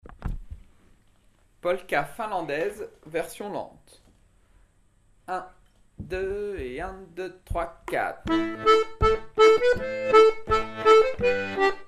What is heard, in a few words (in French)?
polka finlandaise